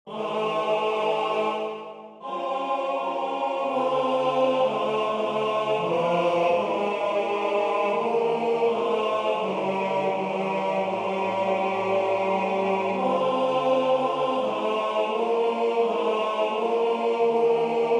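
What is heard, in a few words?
Transformation tenor, wisdomchant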